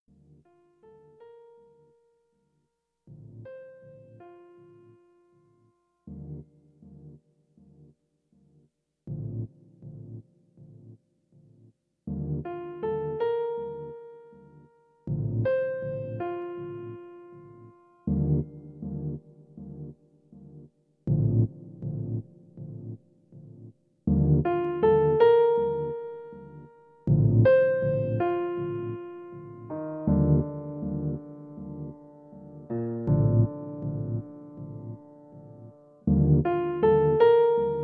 pulse; The Diplomat

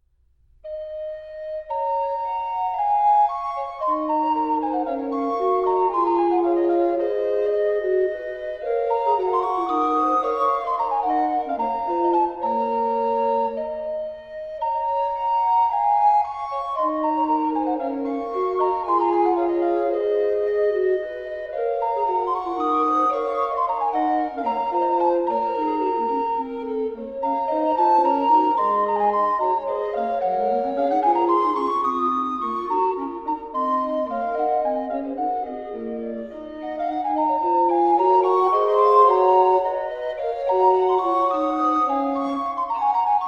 Recorder Quartet; Nichts ohn' Ursach'; Ludwig Senfl

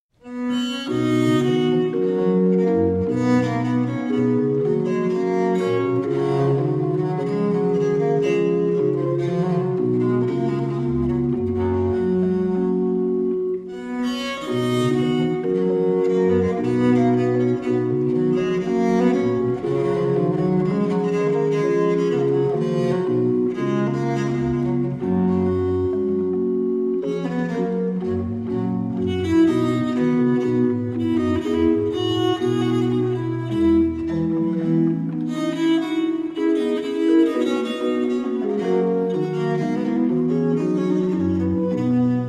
Allemand Sonata in C Major, Viola da Gamba; Johann Daniel Hardt; German Viola da Gamba Sonatas

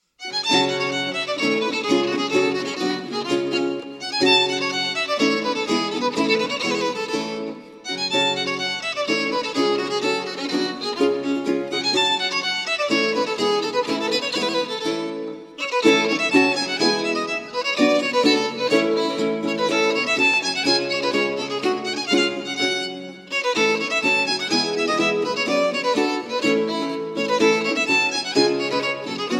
Canario (Presto), spoken; Mensa sonora Pars VI (C 74); Simplicissimus Ensemble

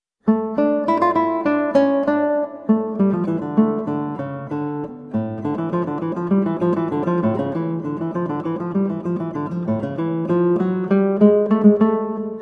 Allegro, guitar, spoken; Fantasia 2 for Flute TWV 40 3; Georg Philip Telemann

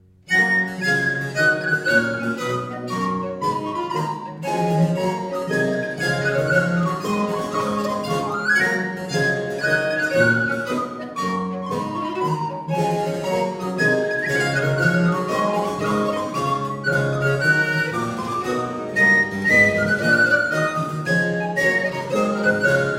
Burlesca in G Major, violin; Ayres for the violin Book 3; Nicola Matteis

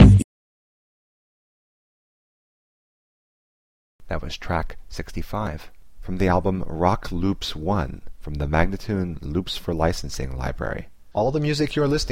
G spoken, Rock Loops; Loops For Licensing

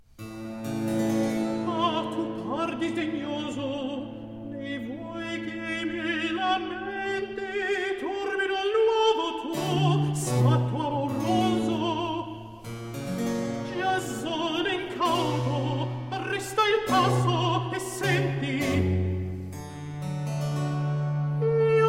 Cantata for alto 2 violins continuo Recitativo; Medea in Corinto; Antonio Caldara

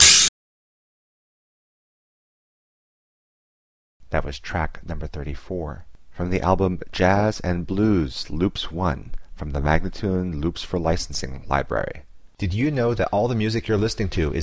spoken, Jazz and Blues Loops; Loops For Licensing; MagnaLoops

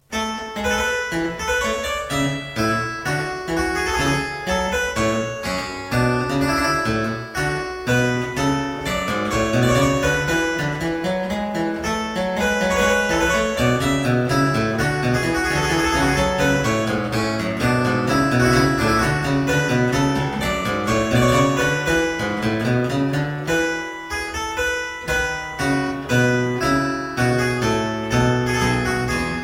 Suite no 7 in B Flat Major - Menuet, spoken; Johann Mattheson; The Twelve Suites of 1714

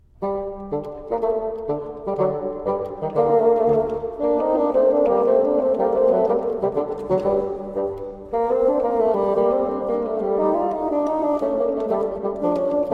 Gavotta, Sonatas for Two Bassoons and Continuo; Sonata No 5 in G minor Op 40; Joseph Bodin de Boismortier

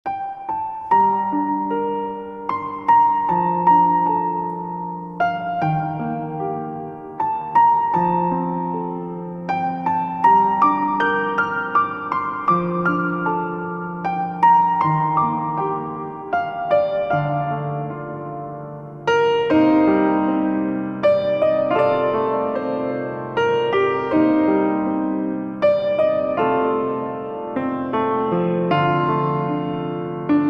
piano version; Finland

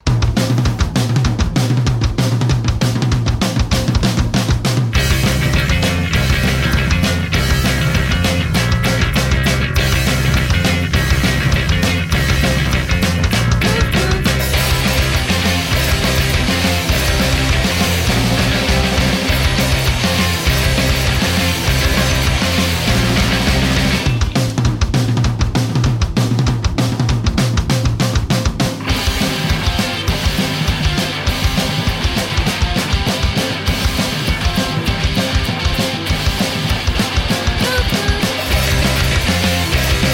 instrumental

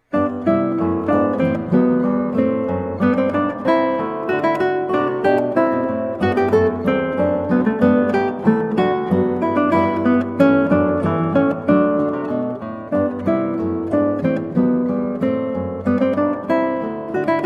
Allegro, guitar, spoken; Partita 2 in G TWV 41 G2; Georg Philip Telemann

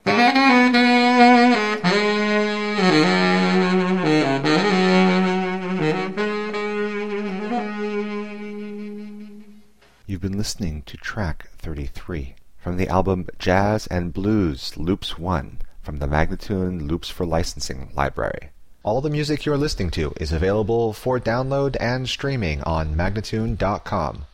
spoken, Jazz and Blues Loops; Loops For Licensing; MagnaLoops

